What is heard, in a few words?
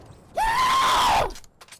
excited